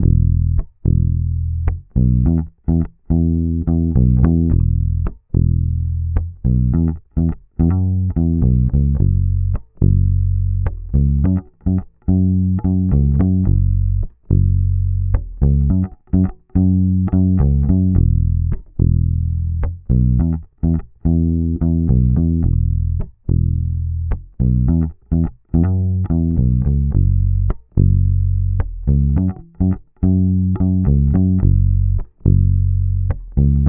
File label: Bass loop 107 E, Electric Bass Loops Direct; Tang; Slate Digital BASSHOLE Sample Pack